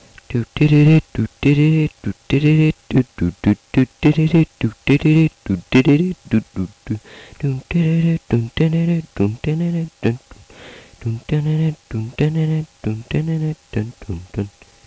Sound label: Country song sample